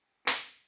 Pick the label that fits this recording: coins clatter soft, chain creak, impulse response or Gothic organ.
impulse response